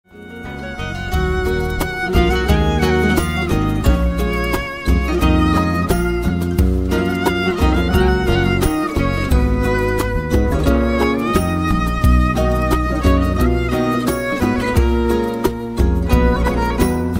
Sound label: nice music turkish